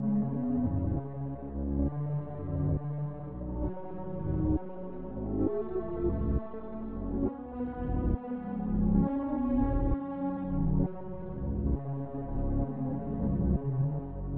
choose plants weird version; plants vs zombies

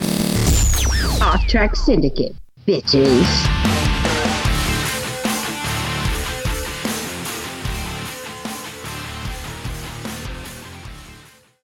stinger

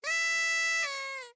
strange party horn

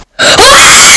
short scream

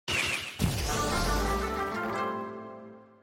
new record sfx; adofai

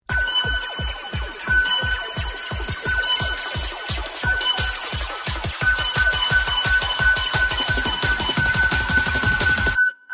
bass

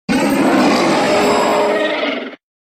roar

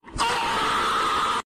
vanish scream; emoji

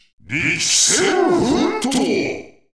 heat burst

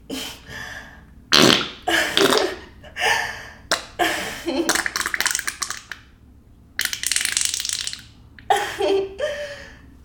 pancake fart

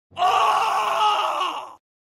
oooarghghaoauhagh

